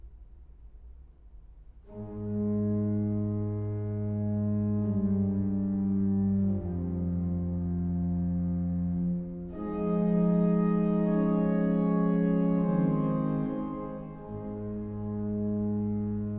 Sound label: Requiem for organ, Postludium; Franz Liszt; Complete organ works